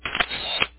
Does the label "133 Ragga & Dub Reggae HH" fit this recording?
No